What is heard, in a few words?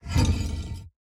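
movement armor heavy; smite; god atlas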